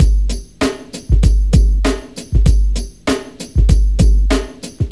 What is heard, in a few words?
Drum loops Funky